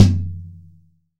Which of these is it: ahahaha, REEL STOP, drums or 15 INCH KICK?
15 INCH KICK